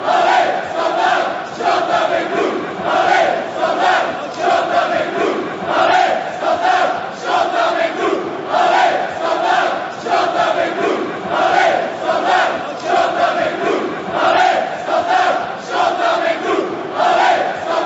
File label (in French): allez standard chante avec nous, chants